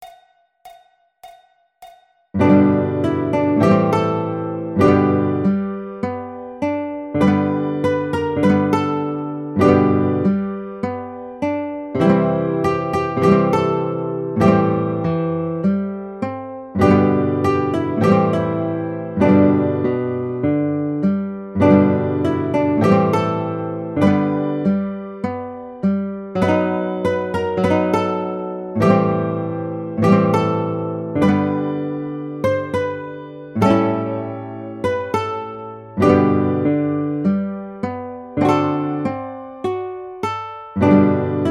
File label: Guitar